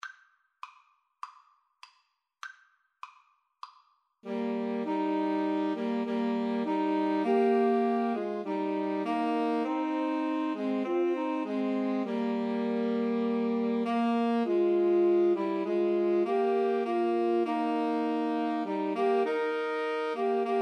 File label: SAX, Woodwind Trio